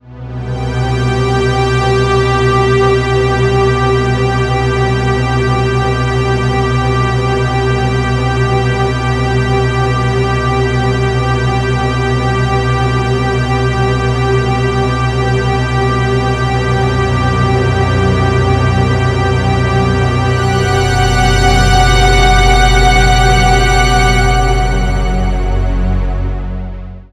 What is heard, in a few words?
cinematic tension ringtone